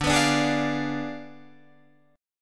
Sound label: D Diminished 7th D down Guitar Drop D